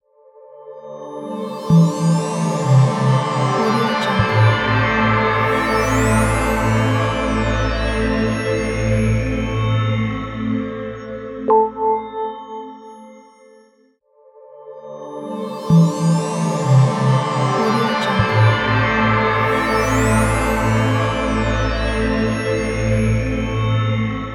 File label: shine logo